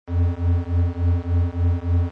Charge; mystic training

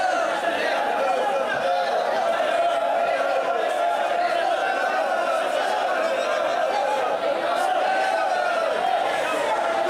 people; anito; ambient

